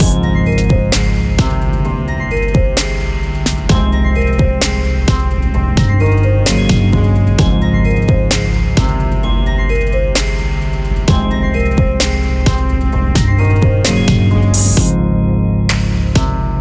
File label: tempo 130; Smooth Life